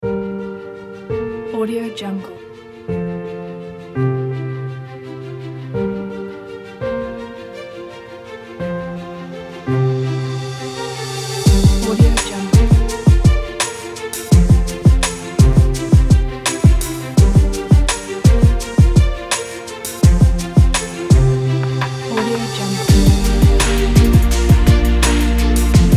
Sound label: inspiring epic hip hop; alexshulgin